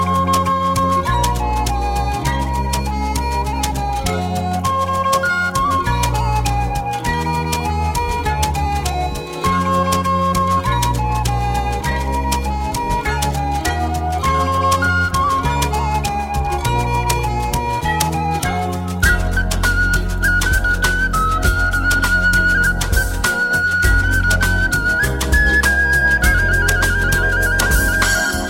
Georgian Ringtone

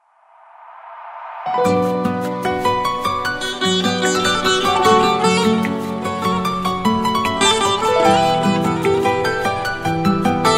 saz ringtone

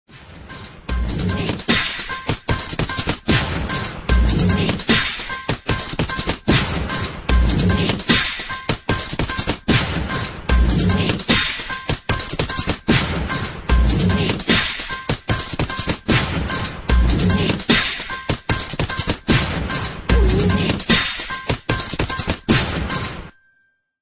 keyboard part

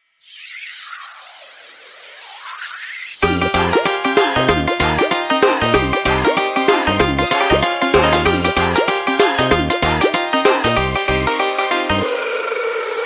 Bhangra Beat

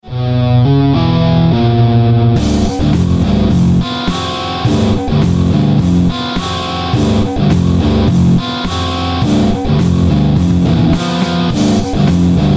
new metal style, ringtone

